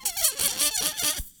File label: Balloon, cartoon Foley Fx; musicradar; cartoon caper